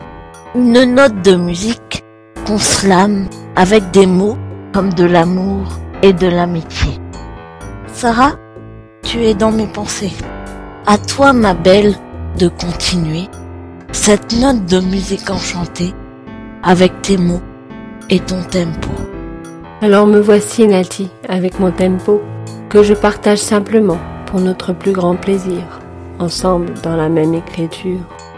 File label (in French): Note de musique; la vie handi mots